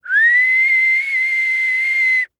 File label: Human Whistle